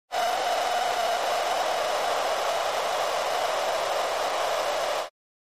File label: Distort Drone High Fr, distortion